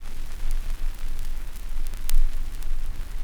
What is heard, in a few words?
X CRCK L, RECORD-FX